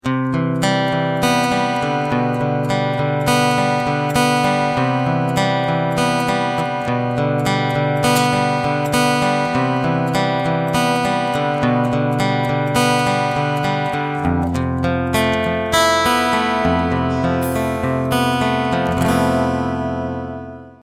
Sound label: Picking Cool Tube